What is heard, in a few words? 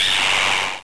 headcrab attack; svencoop; sence; mistake coop